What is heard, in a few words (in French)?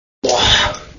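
texture gerbe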